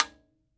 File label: L TIMBA SV, TIMBALES; AKAI S6000 CD-ROM - Volume 5